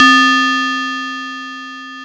syn, Techno; single-sounds; single-synth; Techno ID

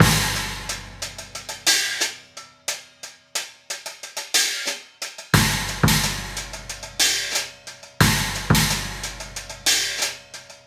Hi Hat and Kick

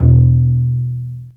BASS PIZZ F1; SOLO PIZZ ST; Greytsounds VOL-1

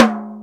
R8 Tom, Roland R-8

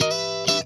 FUNK N CHUNK, FUNK GUITAR; Greytsounds

